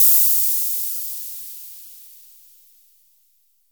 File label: NOISE SPRAY; TEK PERC2